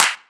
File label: Clap; Equipped Music; Loungin House